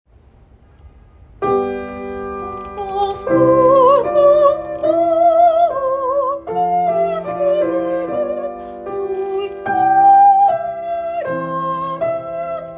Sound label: Soprano; Chapter 3 1-16; Shirei Chesed Fall 2025 Recordings